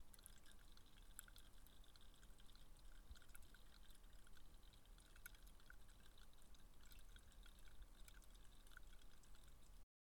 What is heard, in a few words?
Fizz; Trevor Webb